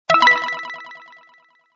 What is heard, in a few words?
pickup camera; JungleReporter